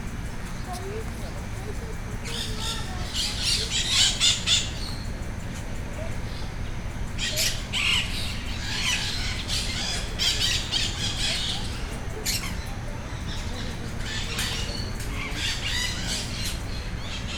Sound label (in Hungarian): hullamos papagajok, madarpark